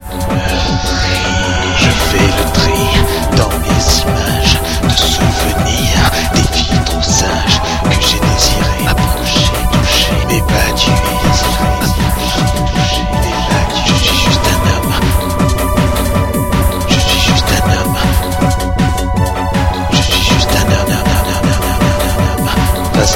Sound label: wilhelm scream; killer